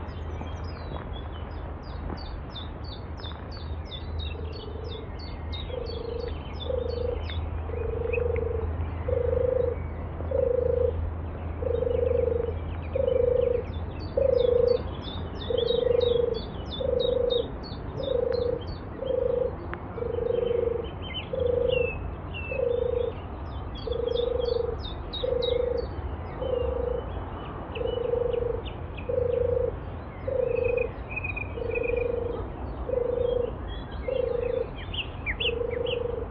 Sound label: Turtle Dove, chiff